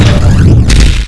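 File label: plate fall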